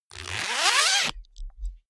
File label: zipper pullover jacket close accelerate; objekte; reissverschluss